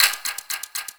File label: hack minigame UI time tick; Warframe; Duviri